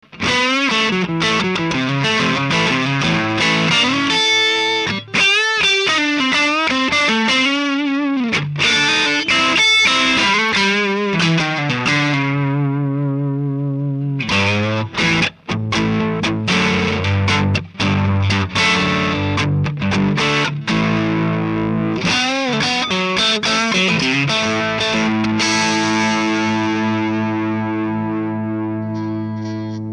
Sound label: strat w gain; connected to a Plll-450 PC with a E-Mu soundcard